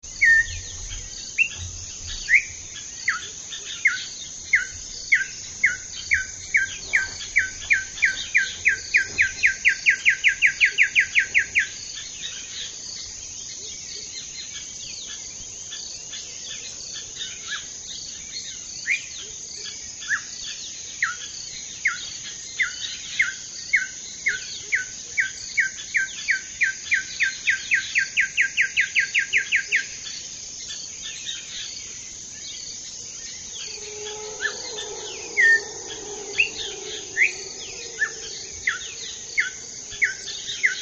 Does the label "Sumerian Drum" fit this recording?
No